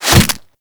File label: Barb Hit Default; Warframe; Desert Skate; Hit Effects